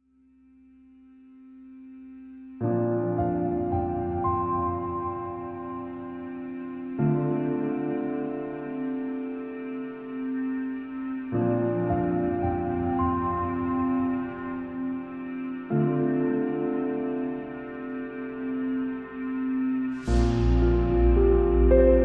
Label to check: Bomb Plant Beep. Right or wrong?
Wrong